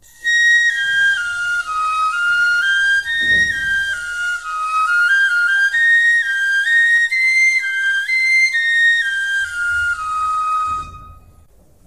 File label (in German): piccolo